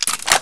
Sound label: clip insert, weapons; svencoop